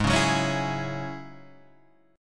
G sharp 7th Suspended 4th Sharp 5th G sharp Guitar Standard; G#7sus4#5 strummed; 4,4,4,6,5,4; JGuitar